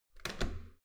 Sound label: metal door heavy handle down